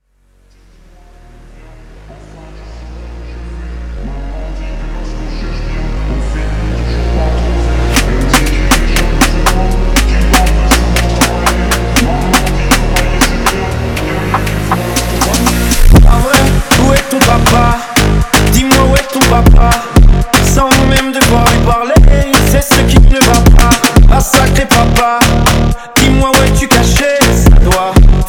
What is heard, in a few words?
phonk